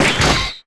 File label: first brand